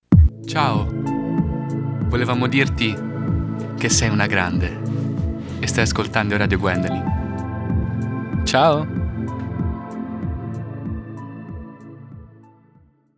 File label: Jingle